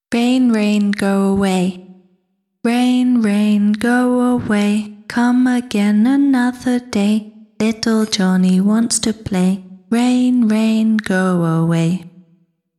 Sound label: rain rain go away